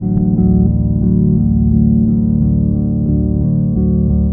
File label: bass; csound